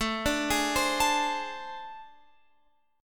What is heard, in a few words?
A Minor Major 7th Double Flat 5th, down, Guitar, Open F; AmM7bb5; arpeggiated